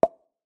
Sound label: pop; CrossyRoad